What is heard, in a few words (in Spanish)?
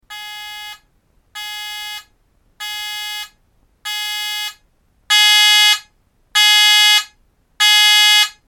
tono